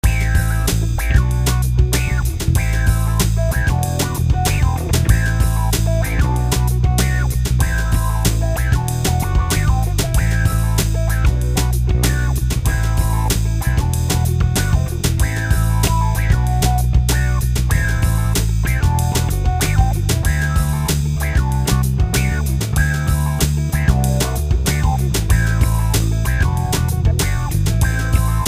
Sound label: backing, Guitar